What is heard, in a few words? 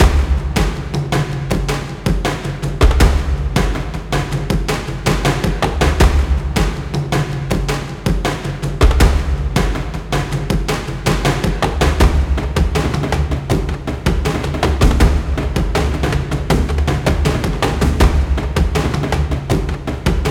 Battle Drums Music; A Bloody Night